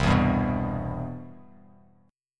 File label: C Major 7th, Bass Standard; CM7 strummed; JGuitar